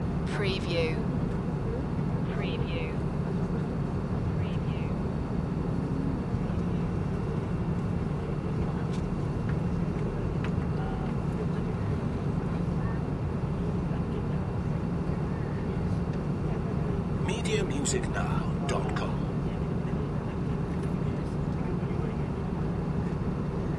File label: idle public bus; loop